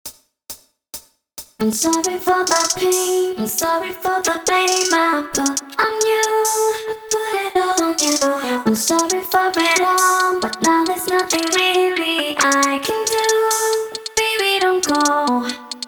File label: key check solo